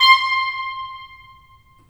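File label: WND CL, wind clarinet; LA Composer vol. 3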